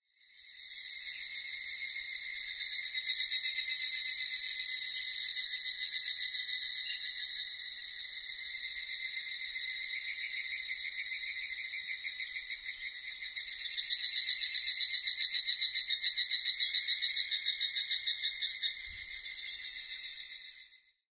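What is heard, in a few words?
evening cicada; higurasi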